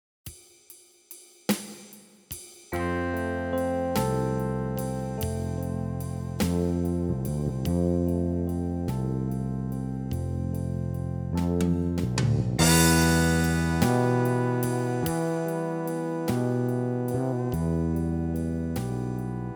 Cross Hand Arpeggios, piano

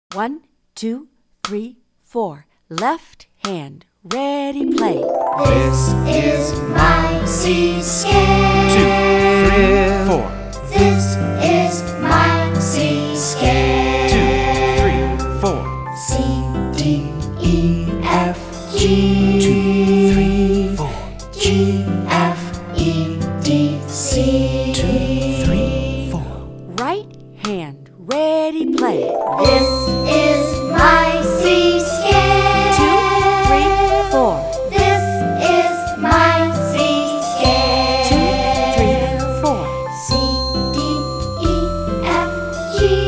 c scale

